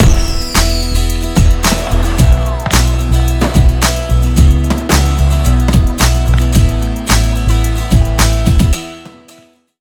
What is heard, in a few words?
alert sound follower